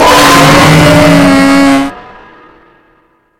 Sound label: jumpscare; fnaleons remastered